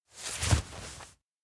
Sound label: stamp card put pocket; anemoi